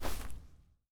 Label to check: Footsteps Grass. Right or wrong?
Right